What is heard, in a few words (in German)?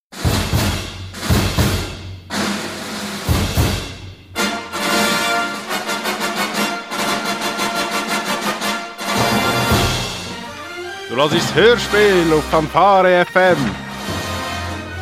Jingle Hoerspiel